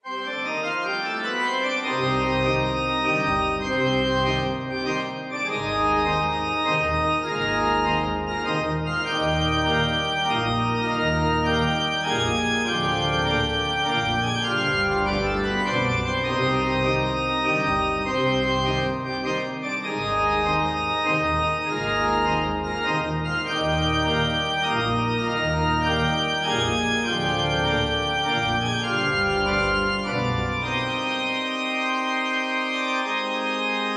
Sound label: Phantom Organ; Yono and the Celestial Elephants